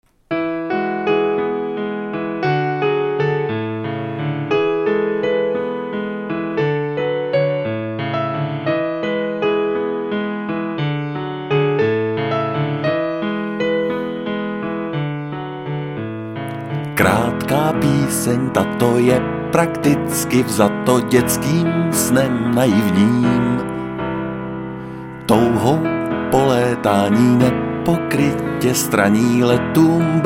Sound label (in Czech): klavir